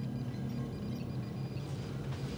Science Lab Sounds; trek

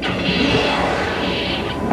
Turbolift Close; trek

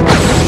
Sidearm Fire; trek

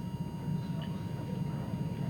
Replimat Sounds; Trek

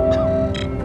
Comm Open; trek

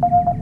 Ship Comm Beep; trek

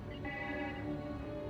Red Alert; trek

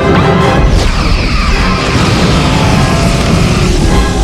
Cannons Fire; Trek

